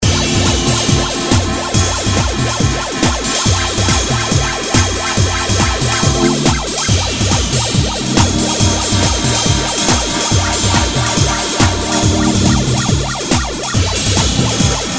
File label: chords; lingsynth